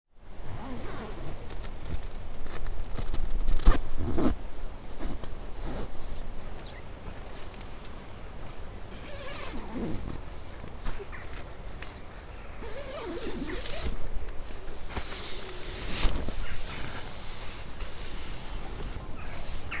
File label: durdle door zipper